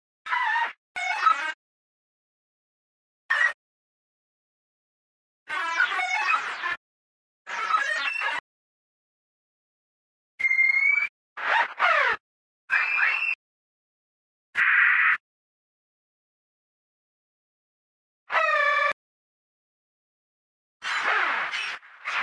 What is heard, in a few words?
advanced beacon noise, Diving; Barotrauma; barotrauma ek